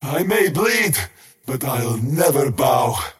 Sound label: skywrath crown deny pain; skywrath mage